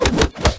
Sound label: princess melee